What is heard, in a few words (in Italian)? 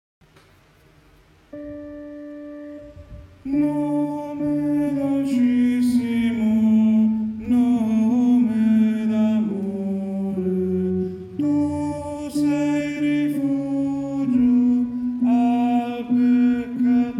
Nome Dolcissimo Soprani